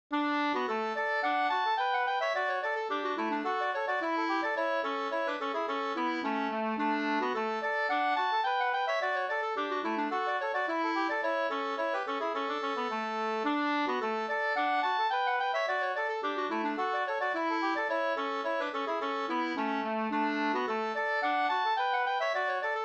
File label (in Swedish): polska, 2st; Erik Fors Bergsjo